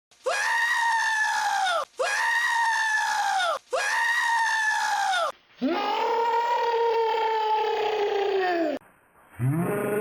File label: Screaming Goat; Rick The Geico